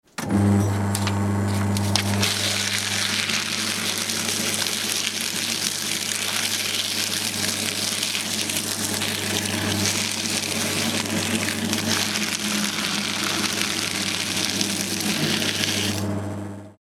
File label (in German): aktenvernichter